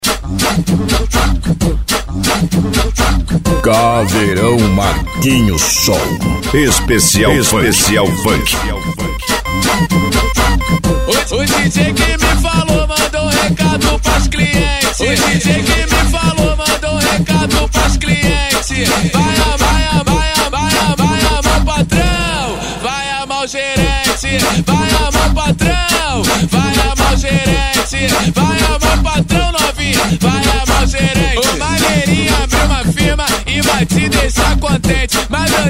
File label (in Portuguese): esp funk; caverao marquinho som